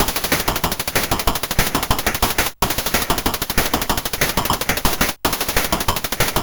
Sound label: Drums, sid CSG8580R5